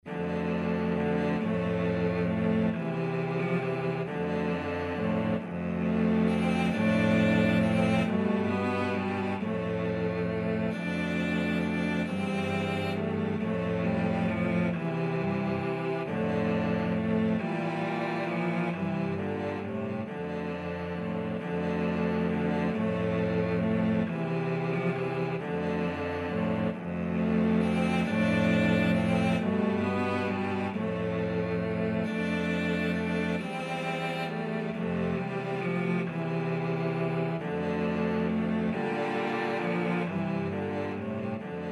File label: scarborough fair, cello trio